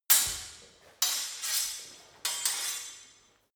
sword parry sword

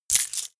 medieval collect coins heavy

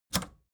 record player power click on fast

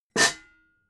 pots hit

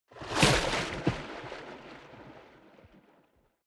waves splash hard

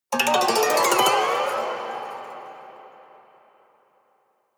haunted strings weird stroke

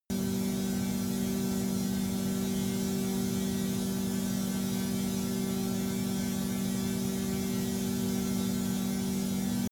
military jet flanker-c turbine idle direct

